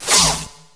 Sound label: G#4 ZAP; RED VOLTAGE